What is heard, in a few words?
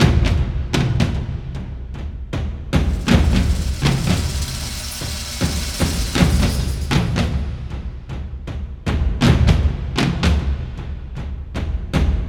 Drums; For Honor